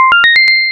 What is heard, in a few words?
coin arpeggio extended